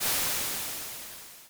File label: Crash Waves, Vermona Mono15 Drums